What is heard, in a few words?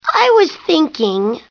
lead in; Pajama2